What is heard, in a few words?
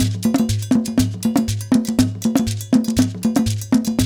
120 JU, African Contemporary Ju Ju; Spectrasonics; Supreme Beats